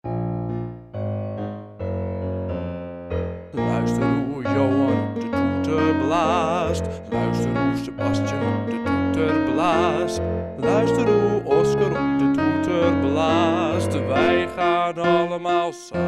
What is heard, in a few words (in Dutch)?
Blaas op de toeter; Music Time